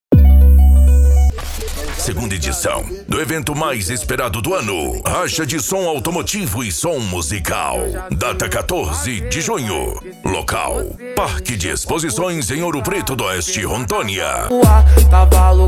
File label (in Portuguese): racha de som automotivo e som musical